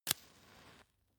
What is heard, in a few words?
match light short